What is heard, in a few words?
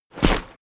step soldier infantry step